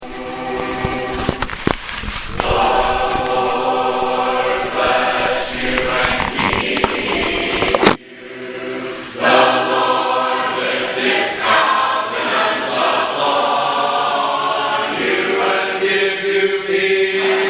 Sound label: Sevenfold Amen